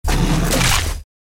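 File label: Tiger attack Mid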